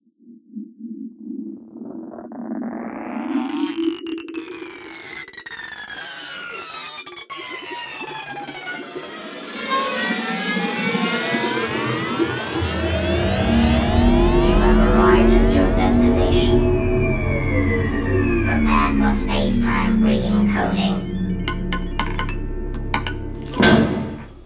Globular Popping Out; sonogram

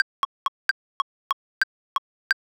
single tempo

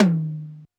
Tom, 707

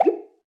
pop

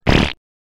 fart

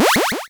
powerup

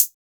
hat closed, 808; 808 aristocrat

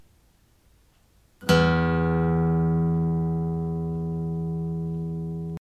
6th String E

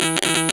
glitch fx, pocket calculator; pocket calculator 24

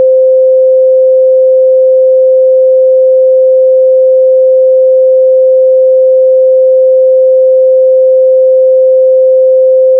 beep

